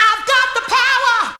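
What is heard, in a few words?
voice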